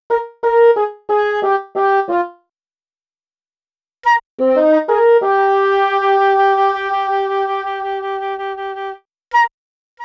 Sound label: Flute Bassoon Combo, Cinematic Funk; Sonic Foundry (Sony Creative Software); Cinematic Funk Urban Tone Poems